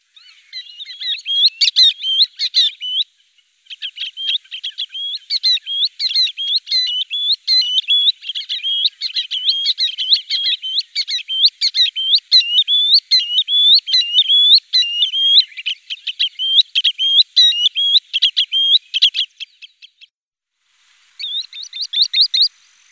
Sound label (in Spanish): andarrios grande